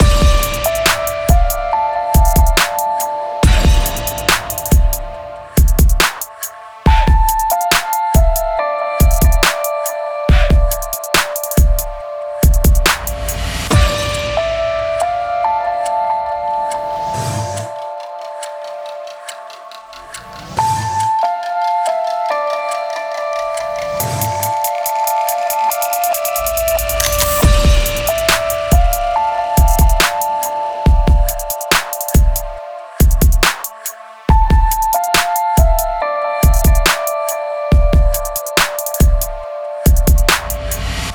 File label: Midi Project